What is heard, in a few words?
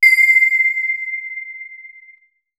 SYN BELL; AKAI S-Series CD-ROM Sound Library VOL-5; FX SYNTH BEL